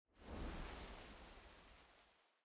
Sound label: movement bubble